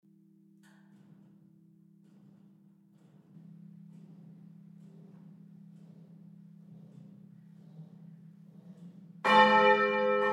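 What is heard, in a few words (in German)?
einzelglocken, Glockengeläut der Stadtpfarrkirche; Stadtpfarrkirche St. Peter und Paul; Einzelglocken Lindenberg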